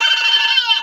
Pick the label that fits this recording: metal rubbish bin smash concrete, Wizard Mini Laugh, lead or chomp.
Wizard Mini Laugh